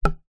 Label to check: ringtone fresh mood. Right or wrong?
Wrong